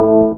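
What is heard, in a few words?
synth dance; Best Service Gigapack 1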